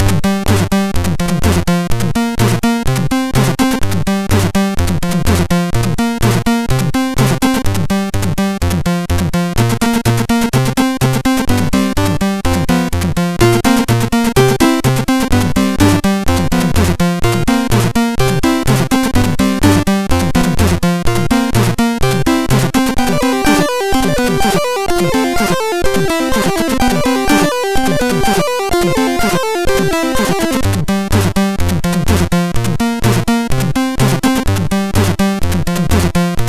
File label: Quick Tune, MOS6581